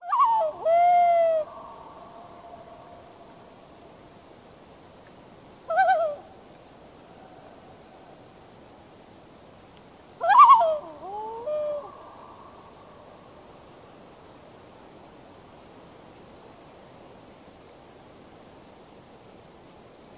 Loon Bird

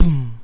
Beat Box Kick

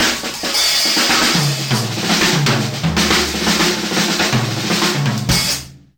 Drum Solo

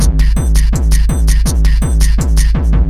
Noi Core Hardcore

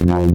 Bass Hit

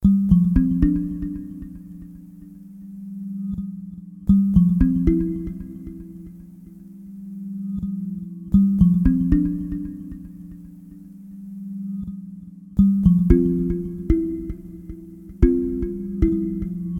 Kalimba Loops

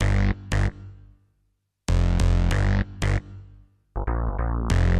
HipHop Bass

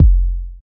Kick Drum

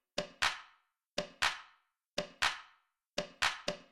Clap Pattern

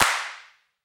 Clap Hit

